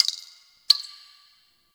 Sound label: Ocean Interior Drips; Warframe; Grineer Ocean